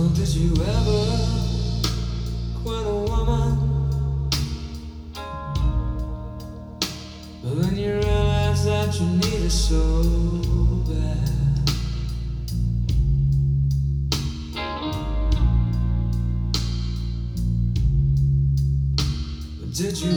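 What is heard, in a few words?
blues piano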